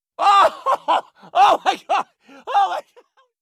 laugh evil; Borderlands 2; Jack

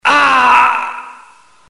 death; max payne

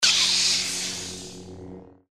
saber; Dark Vader

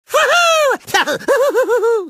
dance; tf; crash

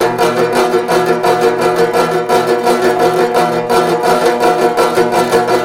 banjo; Left 4 Fortress; zombat

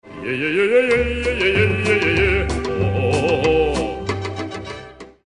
yeyeyeyeye; hl2dm; oppressive territory

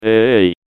pain sharp; John Madden

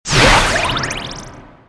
super jump use

